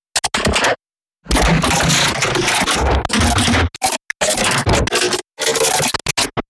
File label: glitch loop